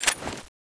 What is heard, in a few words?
gunkata draw, weapons